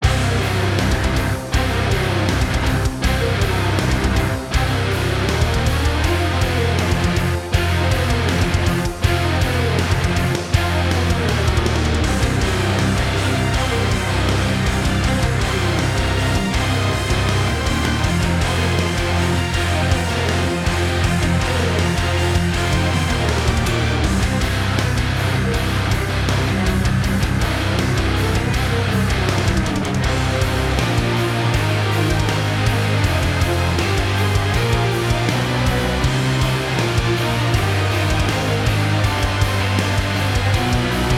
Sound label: Orchestral Rock Surrounded; Orchestral Rock Vol 2